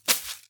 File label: Plastic Sack Dropped